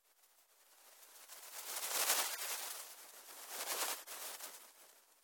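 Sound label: flew and landed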